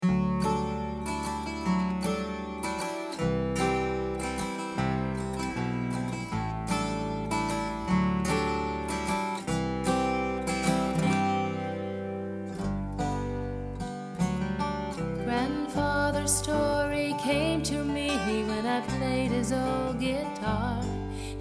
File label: Guitar